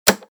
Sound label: light on; nucleaire